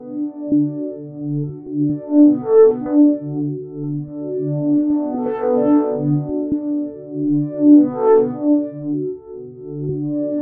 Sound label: 120 Noises Ambient Samples HARMONICS